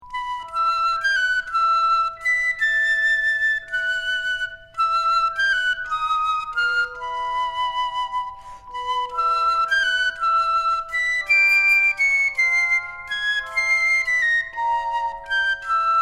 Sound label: piccolo flute cover; harry potter